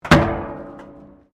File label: METAL BIN IMPACT PLASTIC CRASH BARRIER